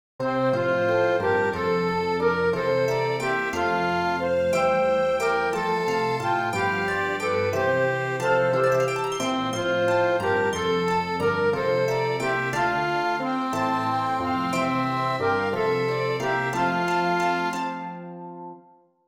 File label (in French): ORCHESTRE